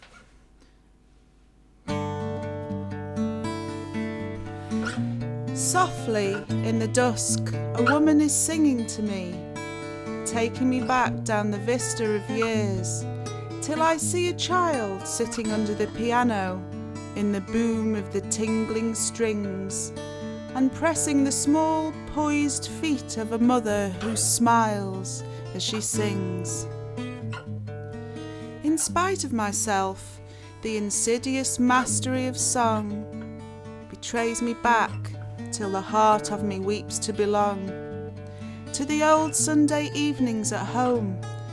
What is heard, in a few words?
Piano